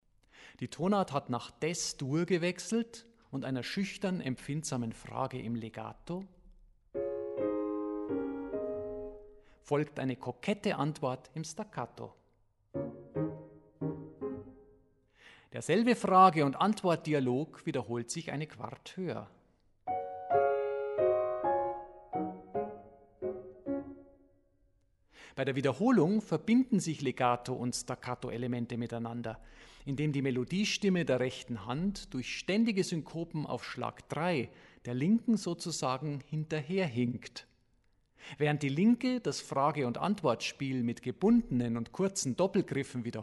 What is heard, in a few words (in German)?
Sonate cis-Moll op. 27 Nr. 2 – 2. Satz